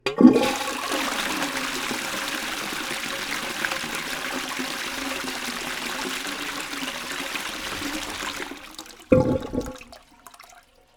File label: Toilet Bowl